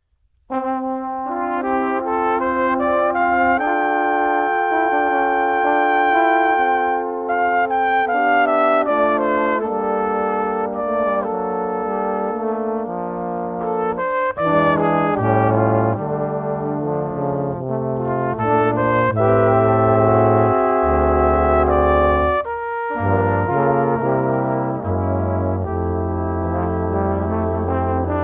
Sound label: Sentimental, horns